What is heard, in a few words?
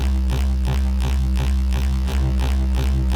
Grendel Bowl Loop; Warframe; Grendel Deluxe